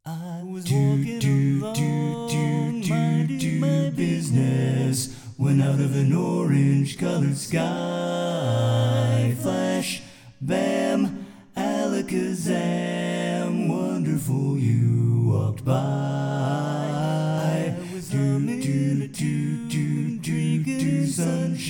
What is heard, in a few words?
Chorus BASS